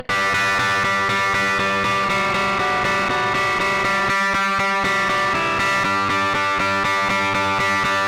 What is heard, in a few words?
guitar distorted; souldriven; Morphdrive